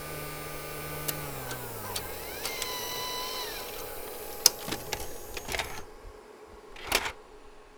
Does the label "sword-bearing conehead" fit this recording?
No